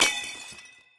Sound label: sealed elemental die; Clicker Heroes